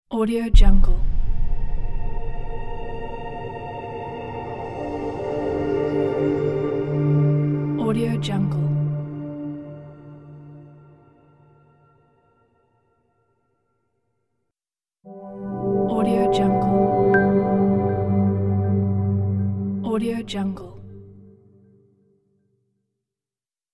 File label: beautiful logo pack